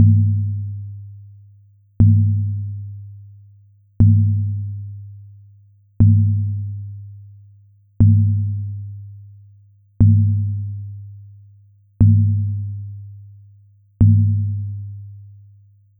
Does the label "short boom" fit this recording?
Yes